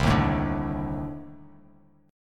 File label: C Minor 6th Add 9th C; Cm6add9 strummed; JGuitar